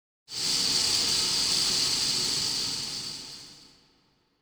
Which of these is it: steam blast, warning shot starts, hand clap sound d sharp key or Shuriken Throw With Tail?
steam blast